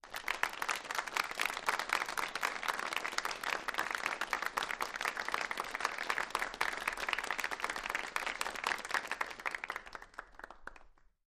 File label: Crowd Small Med Qu, small int